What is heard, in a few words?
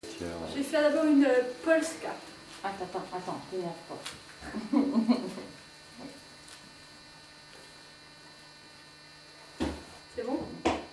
Polska, nyckelharpa